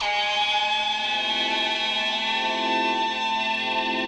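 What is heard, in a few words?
Long Feedback Guitar & synth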